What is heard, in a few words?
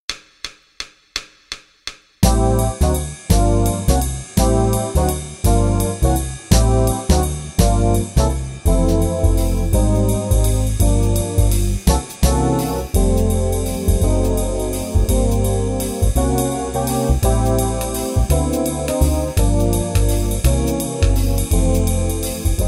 jazz time